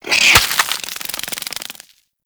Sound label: Mummy Devour Enemy Bite; Warframe